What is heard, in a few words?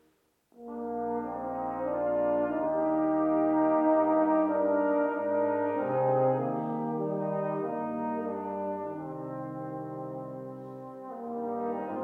Irish Tune